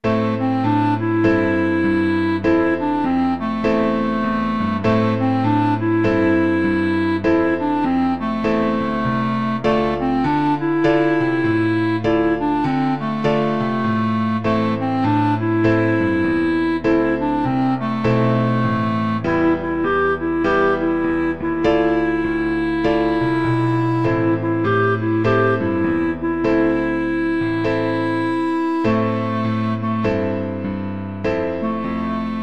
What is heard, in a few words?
clarinet